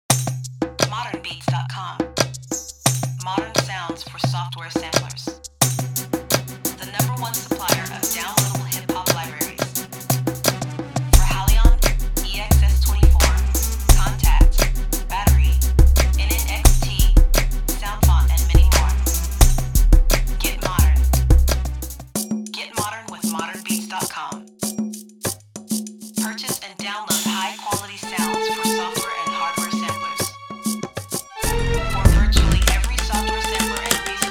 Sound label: Urban Percussion Loopz